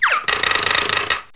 WHEE; Bible Fun Pack; NOAH

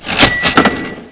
cash register; kabloey redux

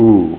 Oooughh; Empire Master 2.6 Demo

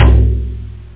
tom; toms

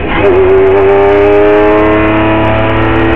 rev up, powerboat; Smash Disk

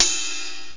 ride

